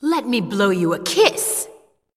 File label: Blow you a kiss